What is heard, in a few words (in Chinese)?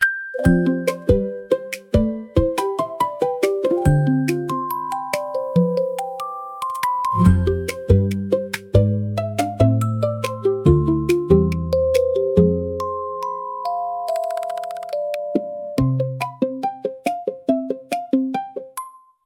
欢快 bgm; 广告视频剪辑素材; 钱袋子